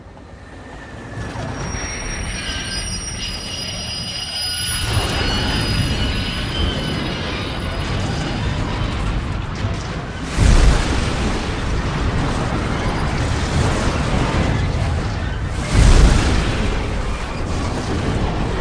train wreck

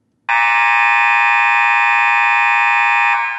timeout basket

